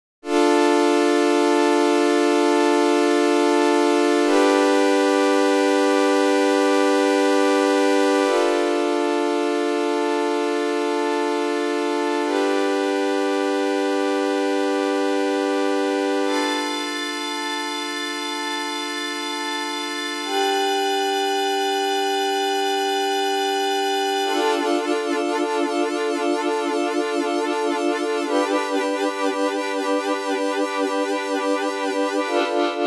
String Filter Pad; CHERRY AUDIO RACKMODE

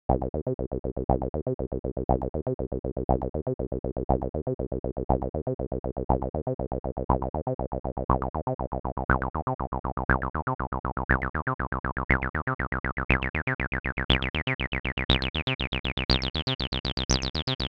ABL3 Saw

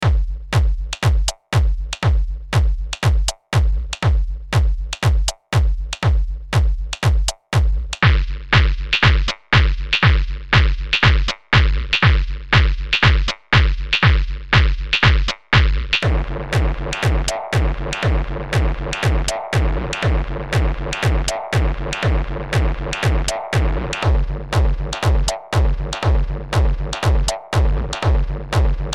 Dist Opamp 21 Drumloop; ARTURIA

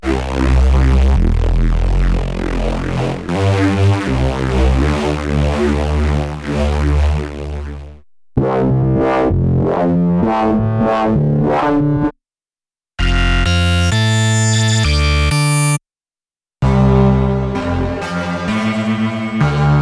User Bass

